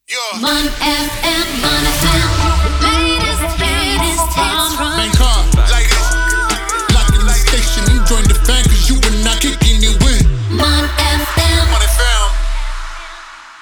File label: FM Jingle Slogan